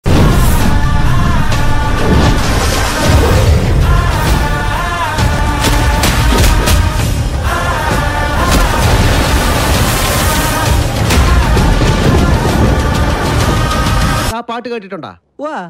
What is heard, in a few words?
Malayalam movie bgm